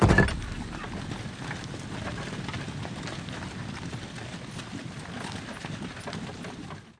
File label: spin Button Click; excalibur